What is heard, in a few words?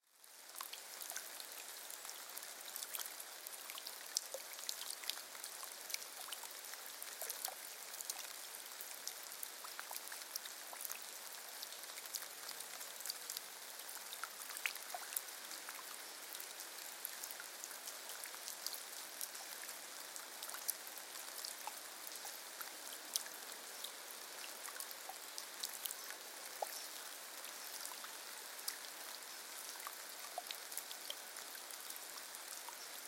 Relaxing rain; MSB Team